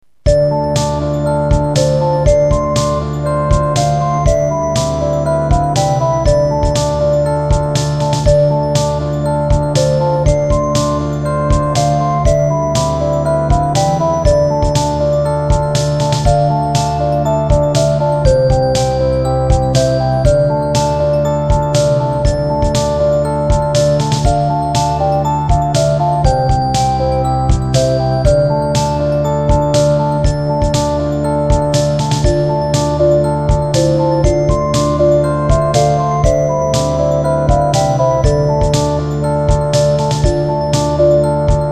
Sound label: dungeon